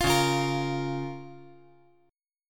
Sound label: Db 6th